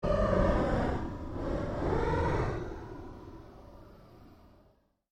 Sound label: Created Cyber Whale Call, Alien Sound Effects